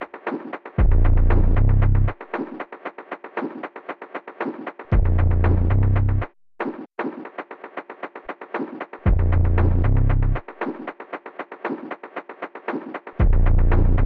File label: Drums Bass and SFX Only